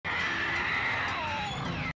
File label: Swamp Monster